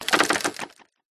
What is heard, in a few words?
science fiction computer; Audio Mangler